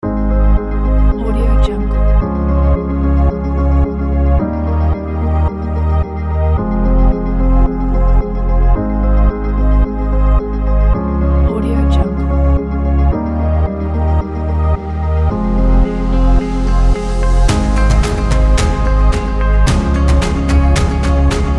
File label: epic ambient